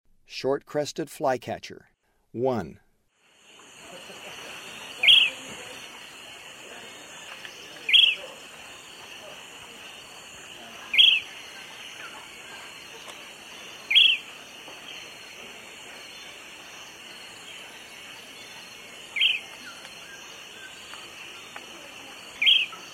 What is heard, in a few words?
Short-crested Flycatcher; S. America; Eastern Lowlands